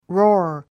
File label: roar